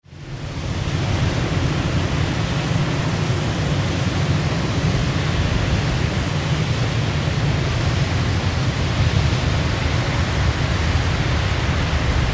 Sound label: Blizzard